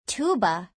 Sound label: tuba